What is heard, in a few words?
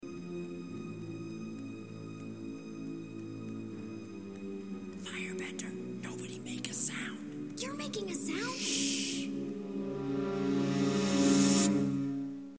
shhh; sokka quotes; book 1 water